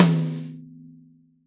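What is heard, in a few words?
Reg. High Tom, Roland BK-5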